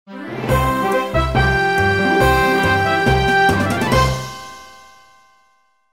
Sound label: Jingle Victory; Monokage Quest; 3DS